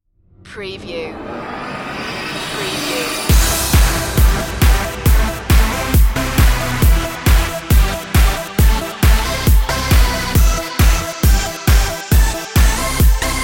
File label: tv punch underscore version